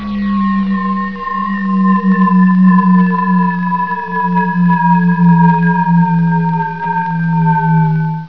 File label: BOMB SAW